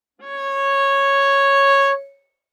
Viola arco ff sul G Db5; MIS Pitches - 2014; Strings

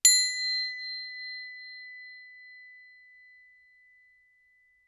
Crotale ff B6 stereo; Percussion; Crotales

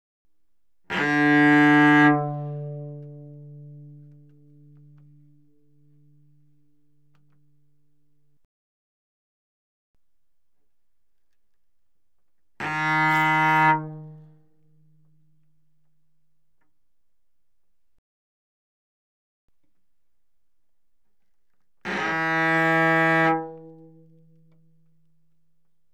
Cello arco ff sul D D3B3; Strings

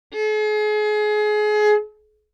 Violin arco ff sul D Ab4; MIS Pitches - 2014; Strings

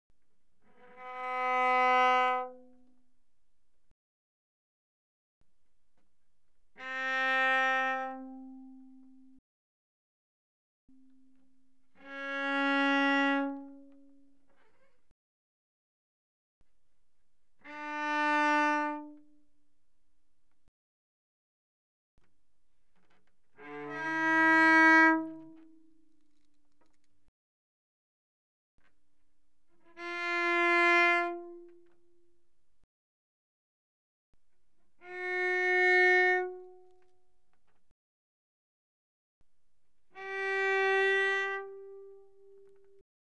Cello arco pp sul A C4Bb4; Strings

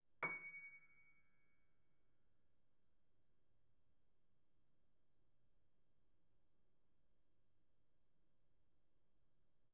Piano mf Db7

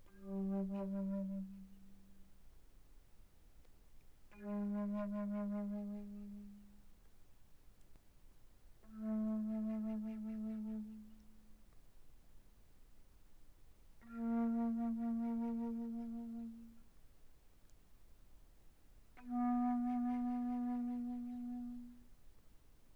Alto Flute pp G3 B3, woodwinds

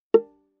Viola pizz ff sul C Bb4; MIS Pitches - 2014; Strings

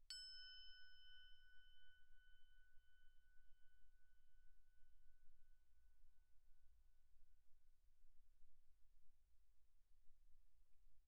crotale Gb6 pp; Percussion; crotales

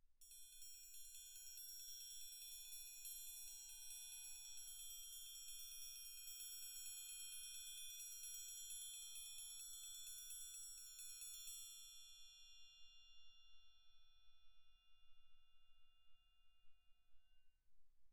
8 triangle, roll, pp; Percussion; hand percussion

